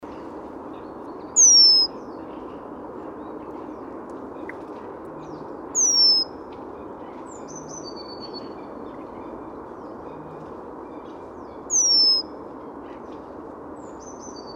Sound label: male in nest call